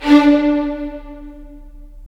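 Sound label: strings violins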